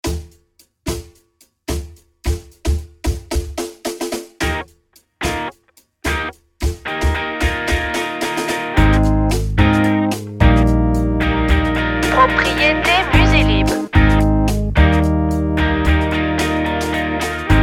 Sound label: happy